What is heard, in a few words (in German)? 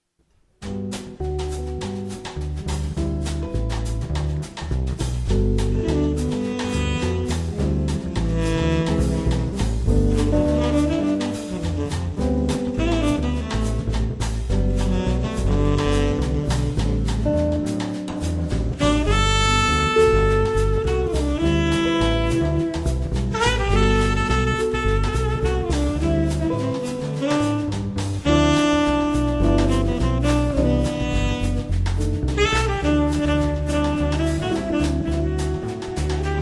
Trio plus Sax Latin Swing